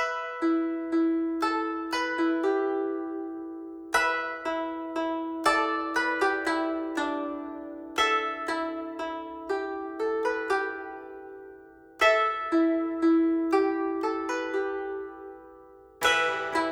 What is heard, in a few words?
The Empty Pot Instrumental